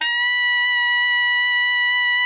ALTO FF B 4, saxophones; Greytsounds - Philip Wolfe David Crozier's Saxophones; GR8 SAXES FF

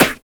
peppy snare; Drums In Your FACE!; NEWJACK

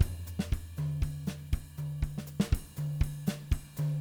120 CRB 4 TOM, CARIBE; Sampleheads; Peter Erskine Living Drums

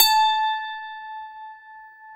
lion harp A 2; SoundBytes; Early Patches

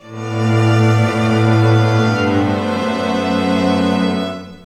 Outta Chamber E, Strings; 03 Outta Chamber 3; Total Drum&Bass

